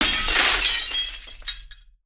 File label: glass break; teardown ps5 windows xbox series xs gamerip 2022